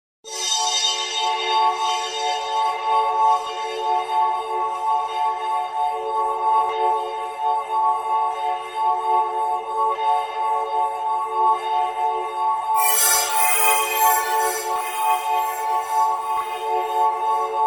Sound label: ambience